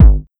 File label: Kick Dist Soft Short; Synthie V1